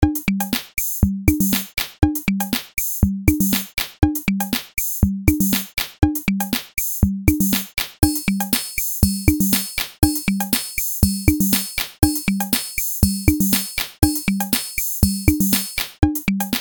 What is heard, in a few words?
oldschool